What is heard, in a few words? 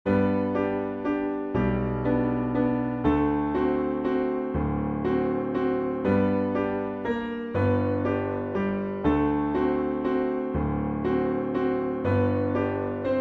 PIANO